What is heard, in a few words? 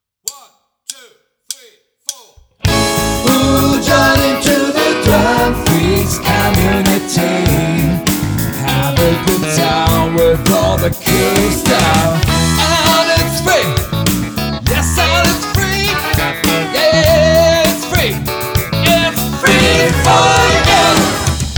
Drumpart Full 100 BPM